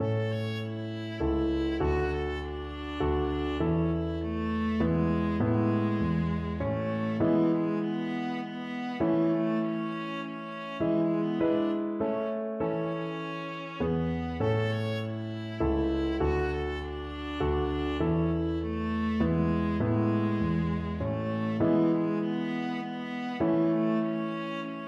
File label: minuet, viola